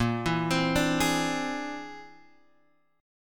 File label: Bb 9th Flat 5th Bb down Guitar Drop D